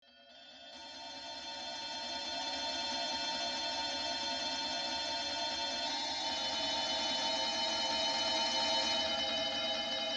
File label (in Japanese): denwa urusai; musicbox